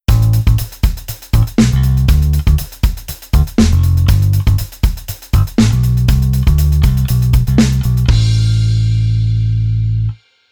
Bass

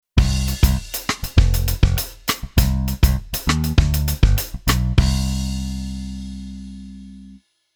Bass Arr Reggae

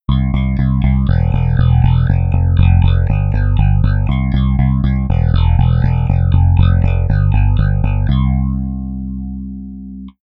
bass effector phaser

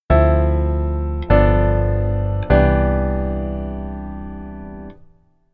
Keyboard Voicing

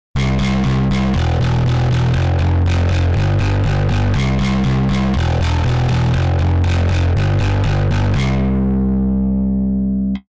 Bass Effector Dist

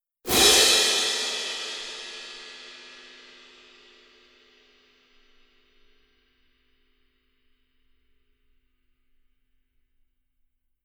cymbals

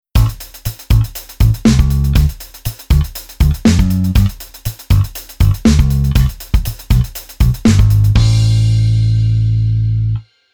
Bass Arr CS Appro Aprro

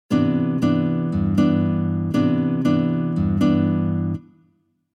guitar pattern

